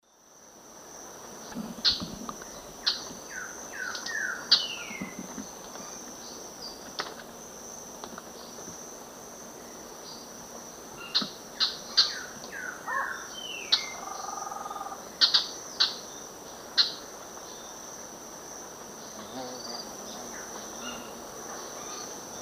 Furnarius minor, call; S. America; Eastern Lowlands

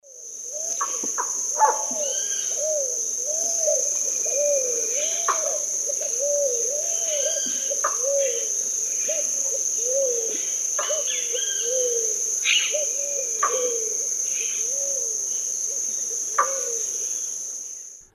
Heliornis fulica, cluck call; S. America; Eastern Lowlands

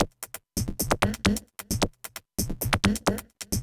House Perc, Dance Beats

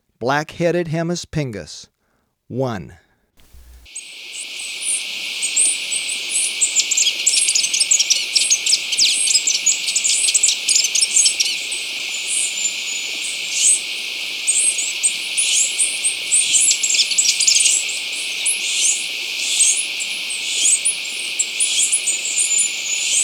Black-Headed Hemispingus, Birds of Ecuador; S. America

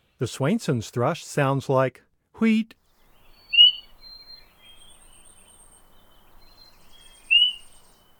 Swainsons Thrush Flight Call; North America; Common Western Species; Know Your Bird Sounds

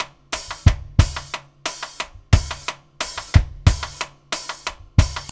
Perc, Percussion Latin

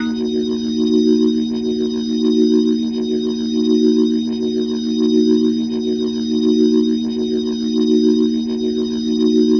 Glowing Organ D# Min; Minor; The Vitale Organ

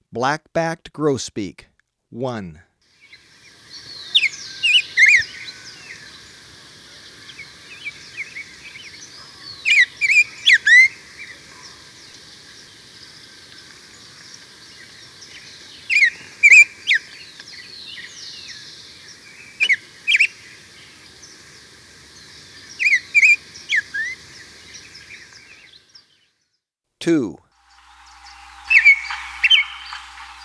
Black-Backed Grosbeak; S. America

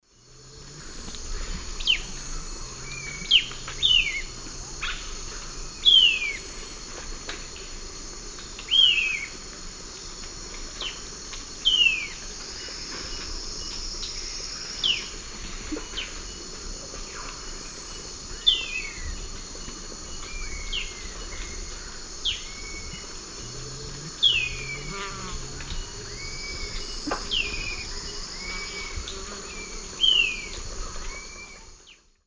Aramides cajanea, calls of chicks; S. America; Eastern Lowlands

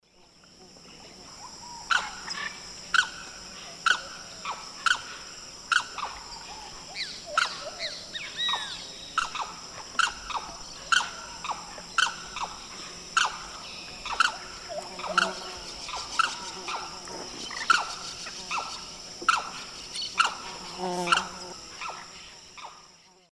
Aramus guarauna, calls of pair; S. America; Eastern Lowlands

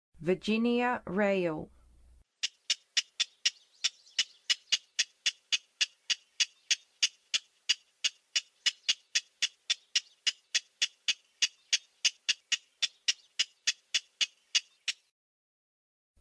Virginia Rail; North America

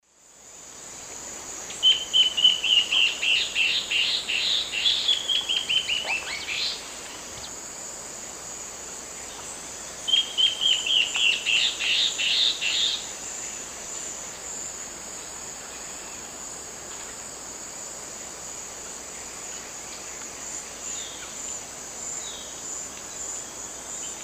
Hypocnemis peruvianus, duet; S. America; Eastern Lowlands

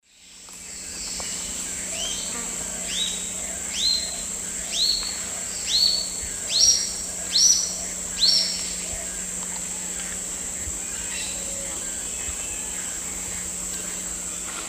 Tolmomyias traylori, song; S. America; Eastern Lowlands

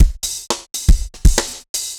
dancefloor tight 120

